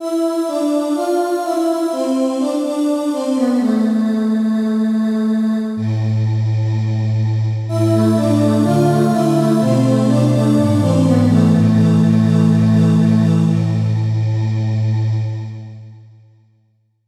synth voice

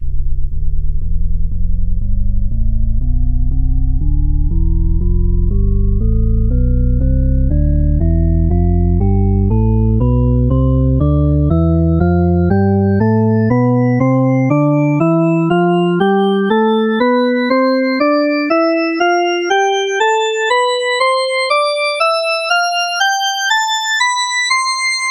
drawbar organ tonleiter

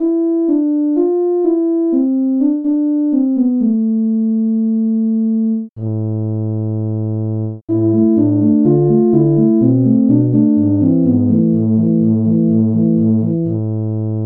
tuba

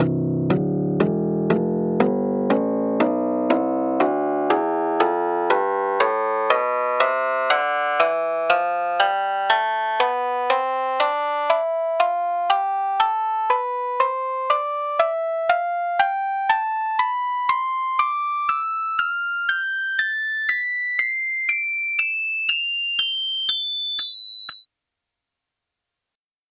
shamisen tonleiter

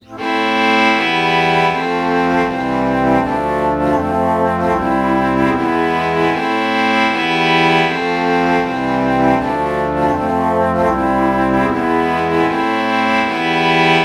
alto flute violin chord morph

